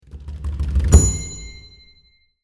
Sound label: Empire game reward quest complete